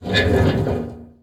target down; ambient

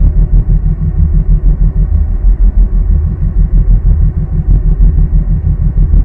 portal, ambient

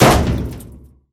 barrel collide

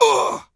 wound, stalker

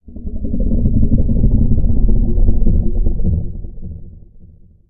rnd dark, ambient outdoor

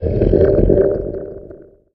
moan; ambient; outdoor

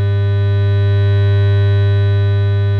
hum; ambient

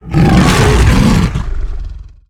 chimera attack, monsters

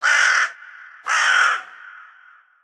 crow, outdoor ambient

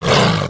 pseudodog hurt

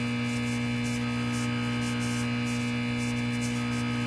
daylight lamp

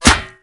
metall, bullet collide

